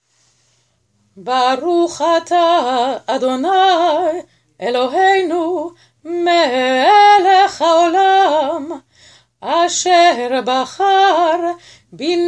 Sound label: Blessing Before Haftarah